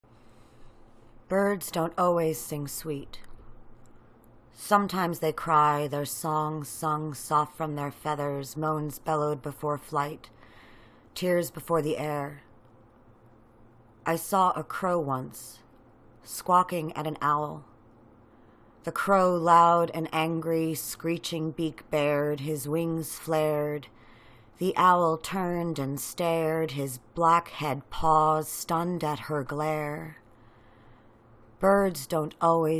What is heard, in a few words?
Bird Song